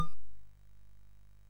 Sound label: War Cars Construction Kit, MOS6581R3